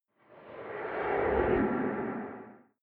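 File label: underworld inhale, drones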